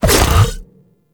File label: bite cast; Warframe; Voruna